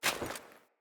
Foley Action Armour Walk; Deora Armour

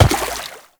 Step Water; Movement; Uriel; Warframe

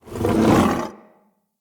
stag headbutt; Powers; Stag Pact

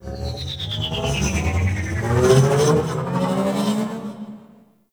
Ship Gen Start Stop; Warframe; Corpus Ship Remaster

